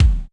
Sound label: BD ROOM, bass drum; BASSDRUMS